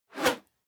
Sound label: whoosh whistle fast